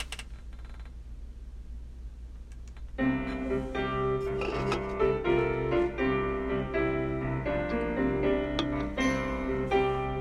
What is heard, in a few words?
Gaelic Alleluia Bass